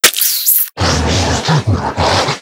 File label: zombie ability; ambience